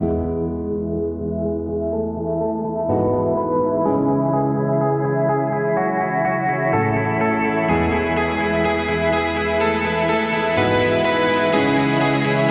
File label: Epic Motivation